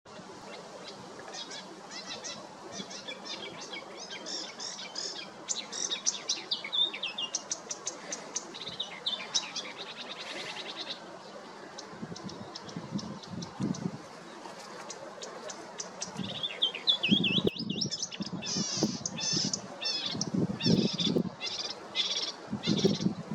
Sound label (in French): Acrocéphalidés Acrocephalus palustris Rousserolle verderolle; 120-Acrocéphalidés-Acrocephalus-palustris-Rousserolle-verderolle